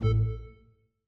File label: Ding; Longhorn 7ista Sound Scheme by David Mounder